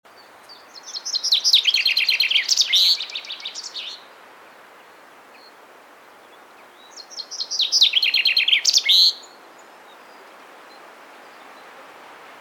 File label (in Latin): Fringilla coelebs; SETE